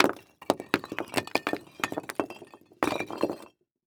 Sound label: Impact Brick Falling Down Long